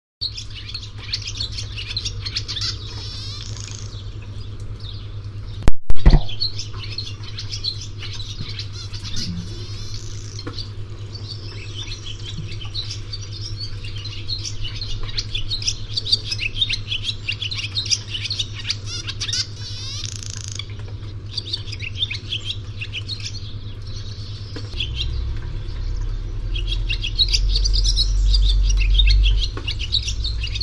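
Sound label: barn swallow voice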